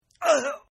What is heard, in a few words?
Grunt; Partners In Rhyme